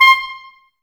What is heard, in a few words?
clarinets, Wood Winds; Miroslav Vitous - Woodwind Brass Ensemble; 90's Sample CDs